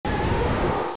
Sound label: Boss Scream; zelda